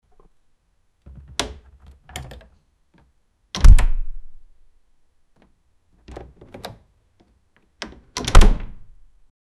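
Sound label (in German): öffnen einer holztür, door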